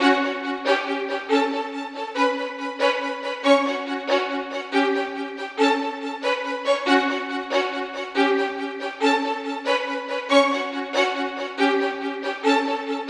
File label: violin; звук скрипки для экшн сцены действие